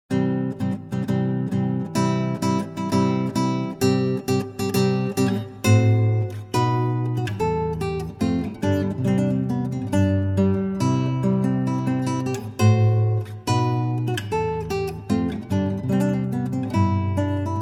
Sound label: Wedding March, Recessional; Felix Mendelssohn